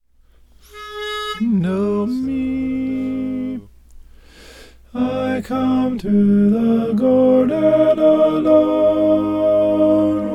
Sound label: alto; in the garden